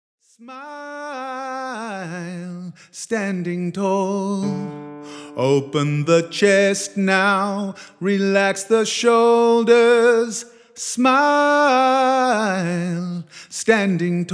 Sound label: SOMATIC VOCAL WARM UPS